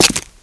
step, player; svencoop

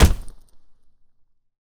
Push Hit; zps; Weapons; Melee

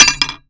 Hammer Collision; zps; Foley

